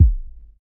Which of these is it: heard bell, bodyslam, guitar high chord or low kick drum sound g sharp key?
low kick drum sound g sharp key